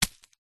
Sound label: warfare knife stab into soil dirt single